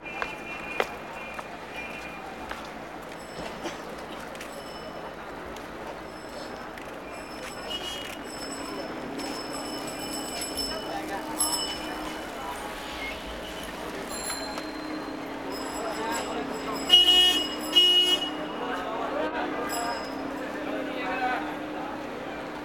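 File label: sounds of cars, traffic jams and car traffic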